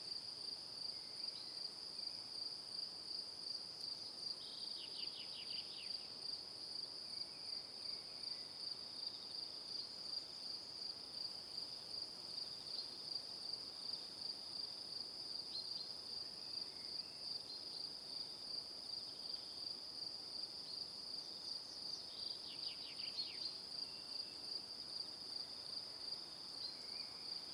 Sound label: Evening Crickets; Calm